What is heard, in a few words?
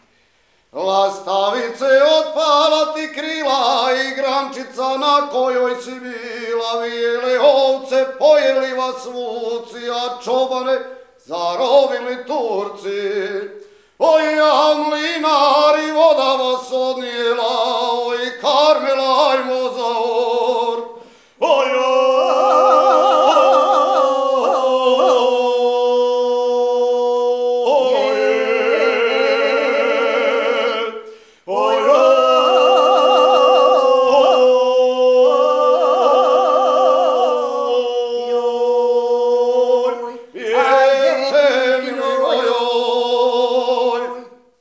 Orcanje, Croatian Folk Singing, ojkanje